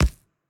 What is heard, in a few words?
build medium; factorio; Factorio 0.14.18